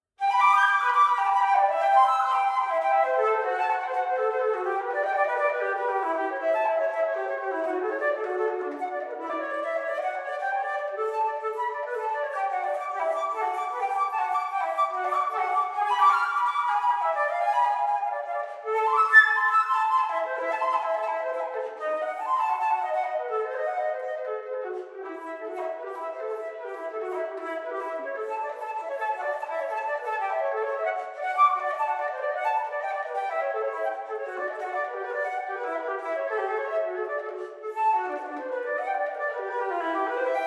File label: Prelude in G Major BWV860; JS Bach